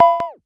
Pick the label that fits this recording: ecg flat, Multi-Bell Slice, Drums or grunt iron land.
Multi-Bell Slice